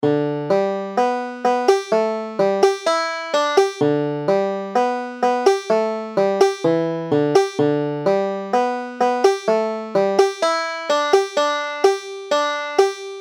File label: sandy river belle, banjo